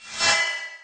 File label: whoosh fast